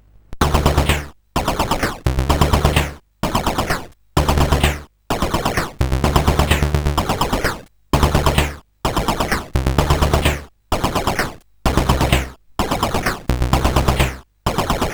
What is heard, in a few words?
sid CSG8580R5; California Games